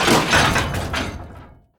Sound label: Smash, Truck; Nickelodeon - Destruction Truck Derby